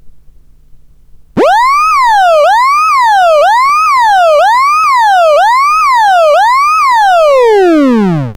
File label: police